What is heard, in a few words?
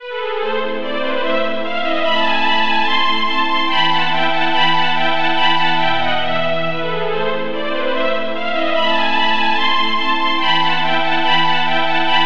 sweep pad